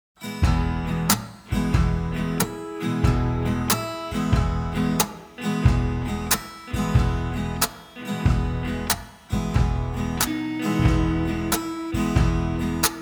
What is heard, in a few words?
Alien Instrumental